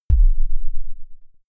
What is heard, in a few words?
sub kick noise